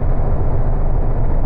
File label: engine; stunt rally